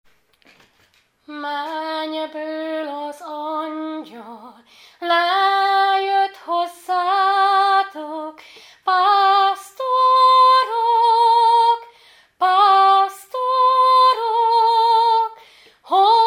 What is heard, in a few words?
digitar